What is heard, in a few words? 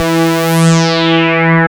BASS, Tekno Industrial; East West